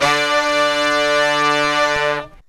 POP BRASS

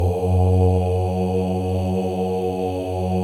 MALE OHS; Spectrasonics; Symphony of Voices

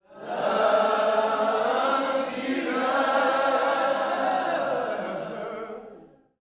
LOVE JESUS, Gospel

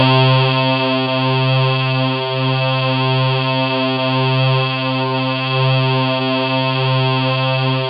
VOCAL W; MORPHEUS

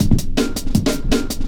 metallic stepz cut, drum loops; Zero-G Jungle Warfare 1, 2 & 3

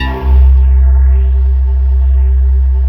air piano C2; AIR PIPE; Synth

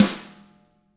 TAMA SNR HIT; ILIO; Double Platinum Drums 1

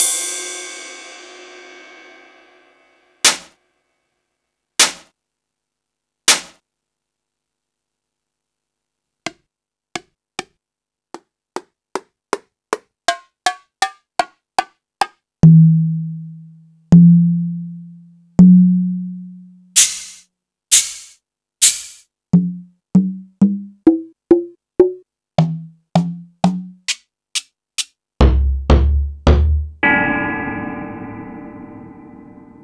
EMU Pro-Cussion

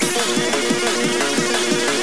loop, SNES; Revolution X

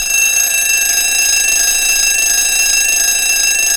FX; AMG Megabass REMIX! VOL-2

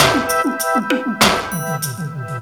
FILL DRUM; USB Soundscan vol.27; FILLACOUS

